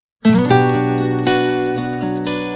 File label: Version Guitar